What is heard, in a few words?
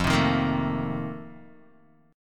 F 11th F, down, Guitar DADGAD